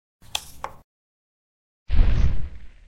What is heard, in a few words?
Tarot draw; Phasmophobia